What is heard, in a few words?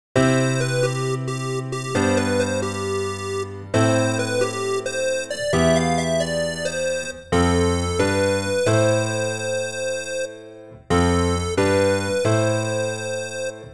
chord